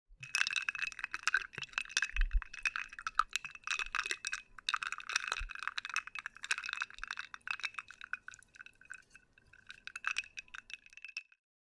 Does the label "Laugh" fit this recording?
No